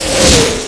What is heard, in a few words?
artillery incoming; ModernWarfare